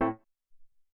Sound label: Tek Hit